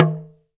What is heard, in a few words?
TALK DRM, Heart of Africa; Spectrasonics